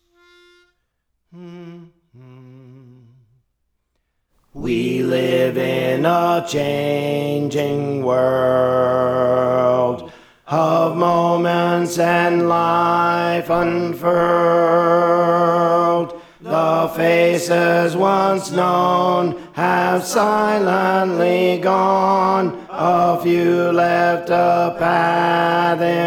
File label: Alto; Praise For The Lord Training